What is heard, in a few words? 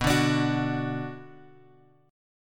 B Minor B; Bm Chord {X 2 0 X 3 2} strummed